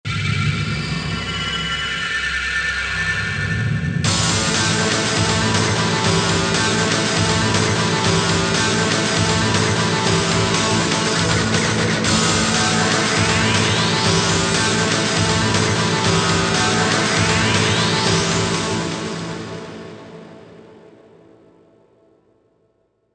Pop; Pro Yakyuu Spirits 2011

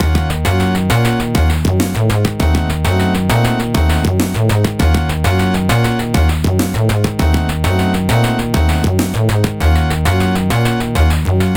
Jingle - Game Over; Game Over From The Hybrid Front; Metal Sonic Hyperdrive; genesis

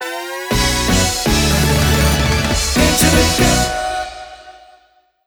Jingle Start

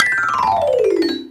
Cake Release

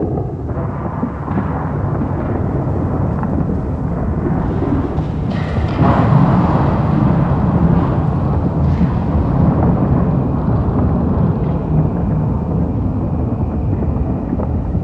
Music Ambience; gamerip; Hellbound

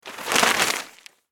Ui Camp Crafting Craft Item

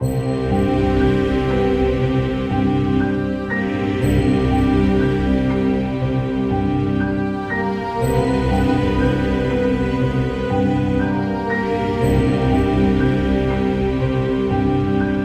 fantasy loop music scary woods

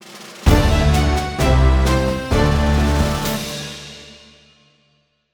jingle level end regular; Seven Seas Solitaire

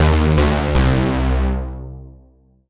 Solo Warm Synth Negative; MUSIC EFFECT; startide

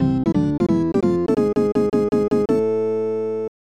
A Drawn Game; Super Double Yakuman; SNES

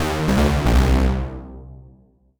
Solo Warm Synth Negative; MUSIC EFFECT; startide

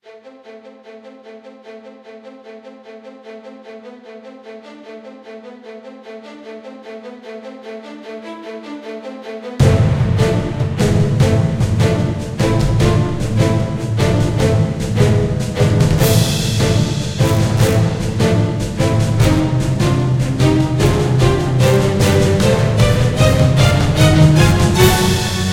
Intro Music

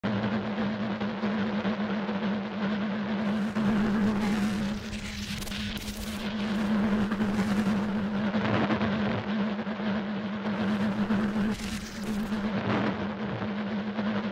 Pizza (Ambience)

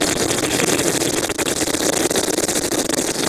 Hoop Suction Slurping Loop

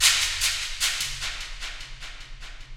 Shaker; Forest

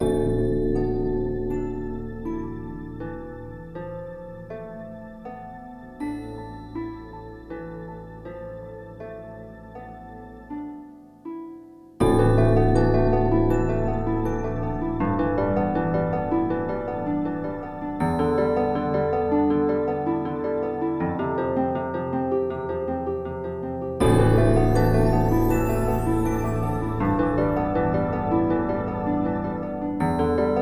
Shrine Loop; Sparklite

